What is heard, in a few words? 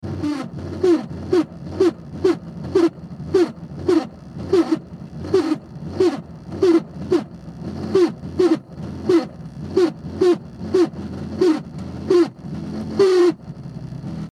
heater Fan defective, Car Heater Fan, Defective; Broken Car Heater Fan , Intermittent Squeaks And Rumbles; Fans; Electronics